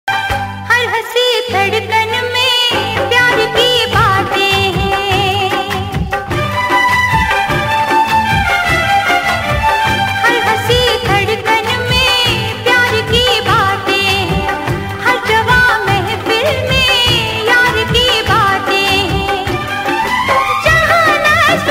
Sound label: Old Ringtone